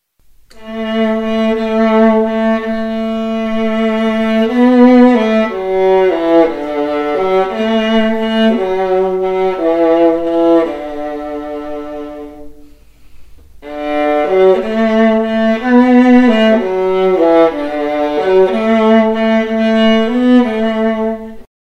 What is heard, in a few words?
viola